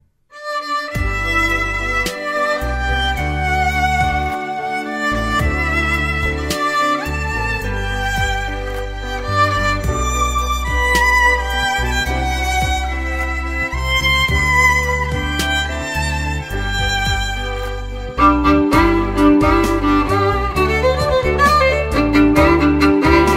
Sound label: HAPPY BIRTHDAY Violin Version